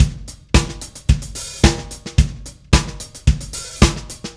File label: Drums, Indie Rock; Session 01 - Brighton; Sonic Foundry (Sony Creative Software); Indie Rock Anthems